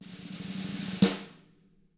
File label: snare roll